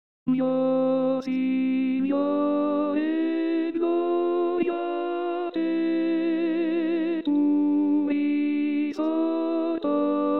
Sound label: Mio Signore Gloria a Te Contralti; pasqua